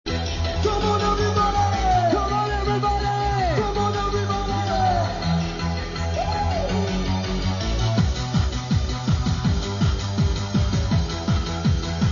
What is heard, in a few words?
mic